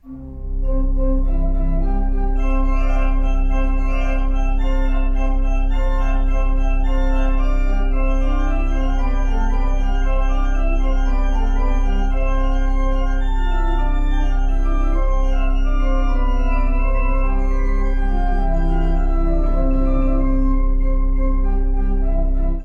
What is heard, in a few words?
Toccata Anfang; Zipoli